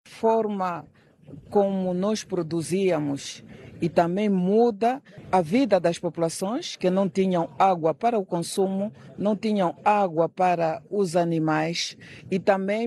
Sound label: HAHAHA